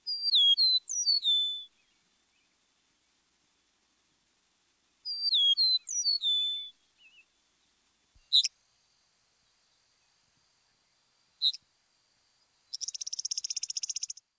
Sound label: eastern meadowlark